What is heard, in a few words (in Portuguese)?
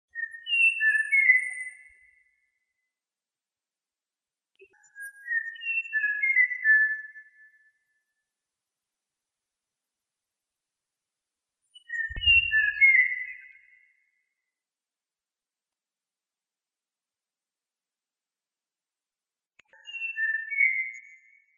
canto do tie do mato grosso